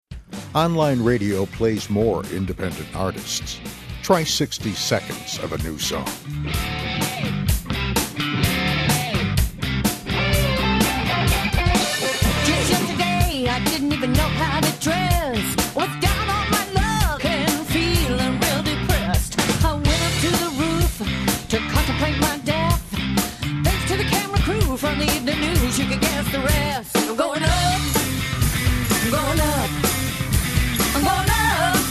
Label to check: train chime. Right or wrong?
Wrong